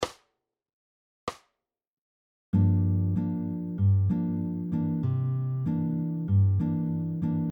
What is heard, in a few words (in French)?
rythmique bossa; bossa nova